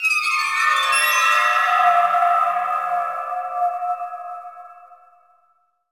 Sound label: Attack FLOURISH; bolt